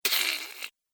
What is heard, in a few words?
Gremlin Sneaky; horror; monster; Monster Sound Effects